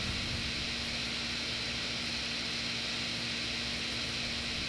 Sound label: apu run